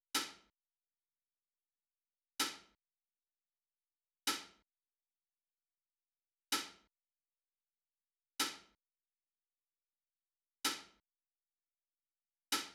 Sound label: hat